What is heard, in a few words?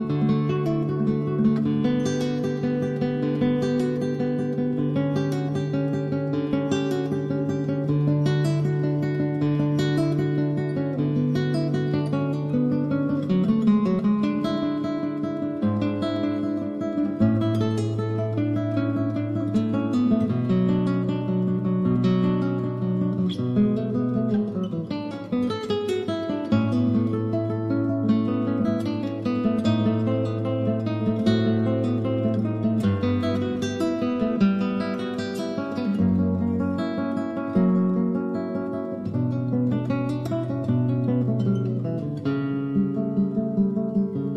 Cello Suite No. 1- Prelude